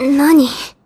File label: combo break